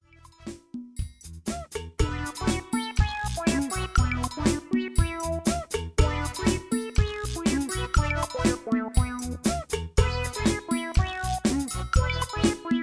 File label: children music